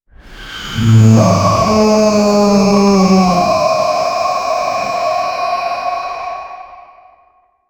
man melting in hell

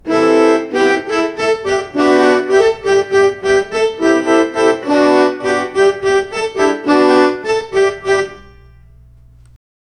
a man playing clavichord, string

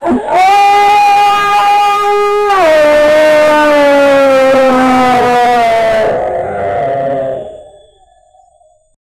sound effect of an angry, gorilla

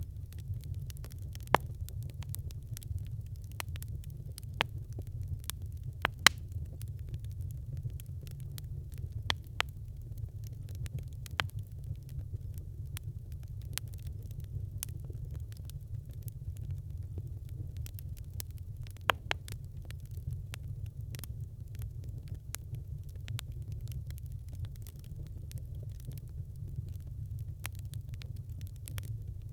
campfire

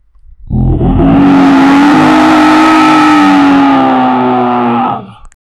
monster roaring loudly

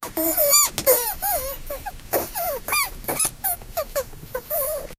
the babys shoes are makin

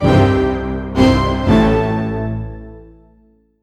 short tv news, broadcast

